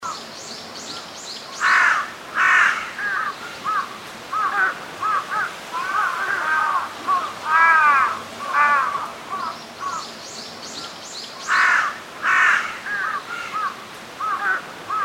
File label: Birds Chirping; 環境音